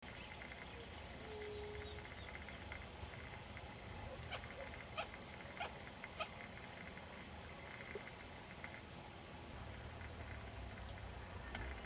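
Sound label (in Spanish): macacomun, Macá Común, Podiceps rolland, White-tufted Grebe; cantos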